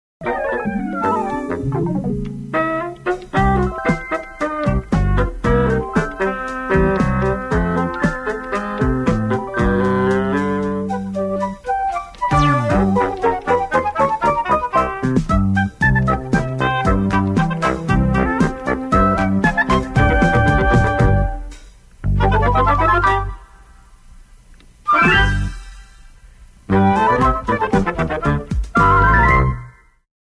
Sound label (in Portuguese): Trilha; IpanemaFM; Spots Agencia Jinga